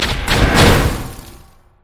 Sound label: ripple effect; nautilus